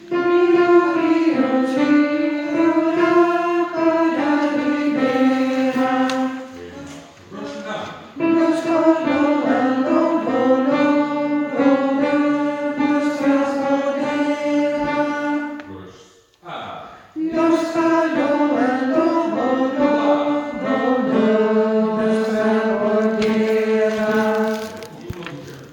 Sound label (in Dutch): Riu Riu Chiu Alt